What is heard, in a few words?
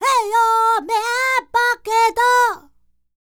Vocal Planet World Voices, NOMADIC